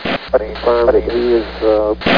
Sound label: tune